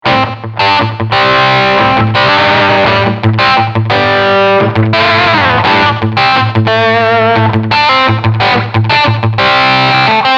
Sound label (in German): Crunch Steg und Middle SC